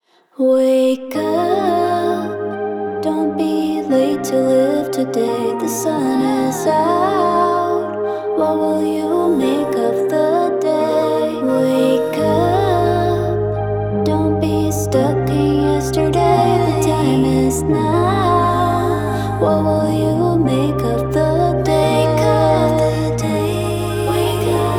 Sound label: vocal production; Roland; Roland Cloud; RE-201 Space Echo; ZENOLOGY FX